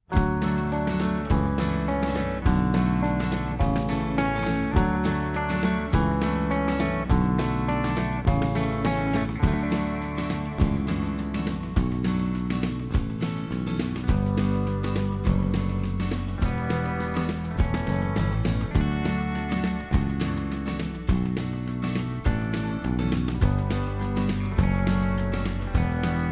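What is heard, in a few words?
Instrumental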